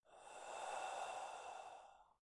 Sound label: deep breaths male out; Apotheorasis